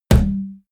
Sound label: can heavy hit saturated